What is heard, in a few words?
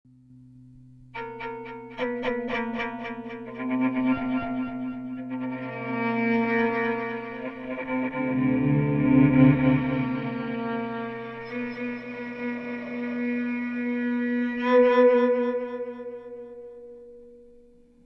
echo delay violin